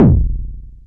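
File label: bass drum, drum machines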